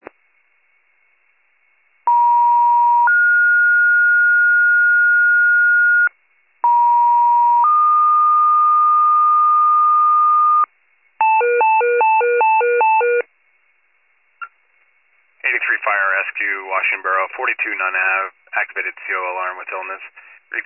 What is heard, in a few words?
FIRE pager alert; 83 FIRE